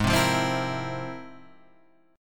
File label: F Minor Major 11th